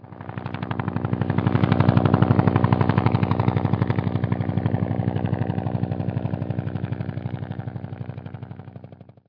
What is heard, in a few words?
heli pass distant; ambient; machines